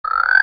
Frog

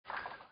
bowling alley fade out

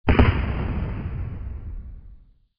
firework good

